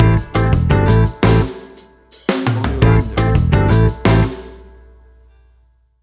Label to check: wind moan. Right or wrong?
Wrong